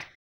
HAMBONE, Vocal Blues Soul; Spectrasonics; Planet

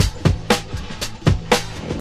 sport, breaks

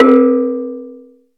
bonang; SN-R8-07 Mallet

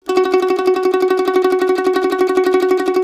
CEE TRILL F# L, Blues Guitar

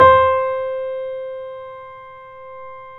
BOSENDORF

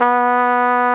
FLUGEL B3, WIND; AKAI S-Series CD-ROM Sound Library VOL-2

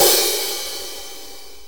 HiHat, Blues; Sonic Foundry (Sony Creative Software); Loops & Samples Drum Tools

